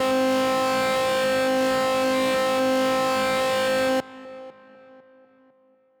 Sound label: 1 shot audio example